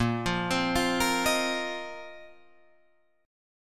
Bb Suspended 2nd Suspended 4th Bb down Guitar Drop D